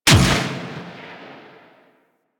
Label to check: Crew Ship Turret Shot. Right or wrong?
Right